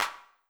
Clap, Old Skool House; Big Fish Audio; Marshmellow; Construction Kits

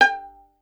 STR VLN, Solo Instruments; Miroslav Vitous

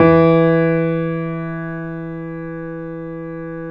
CONCERT PF, E2P; AKAI S-Series CD-ROM Sound Library VOL-7

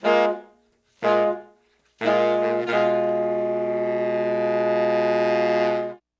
Horns, 70s Analog Funk; Sonic Foundry (Sony Creative Software); What it Is!

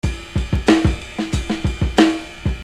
Beat, Hip-Hop Street Beats

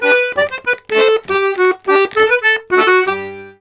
Accordions, BOUREE; USB Soundscan vol.40; AKAI

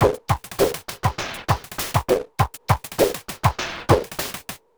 Beat, Industrial

